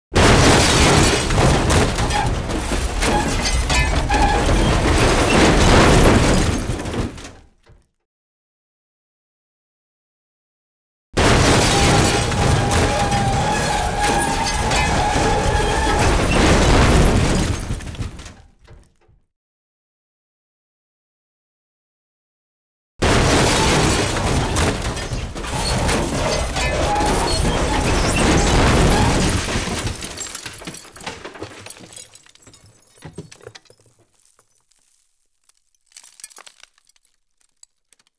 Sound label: impacts large, foley effects; Asian Show Sound Effects